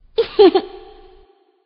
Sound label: Laugh